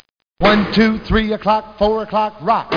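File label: rock clock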